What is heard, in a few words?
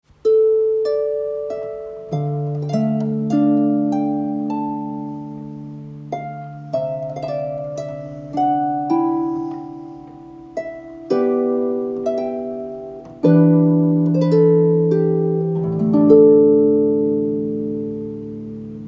harp